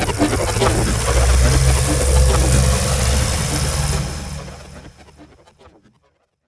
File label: FX; LiLA'c Records PsyTrance Sounds 01